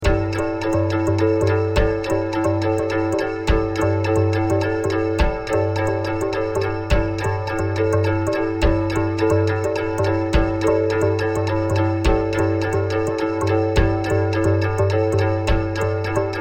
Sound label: 2 5 polyrhythm